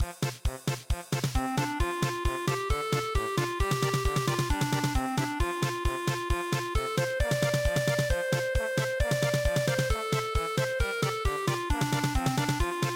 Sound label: polka; lochlomond